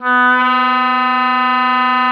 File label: ROLL H3, OBOE3; Xsample VOL-6